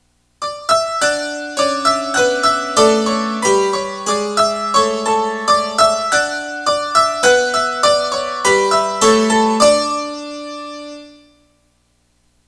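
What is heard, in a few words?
jv880 piano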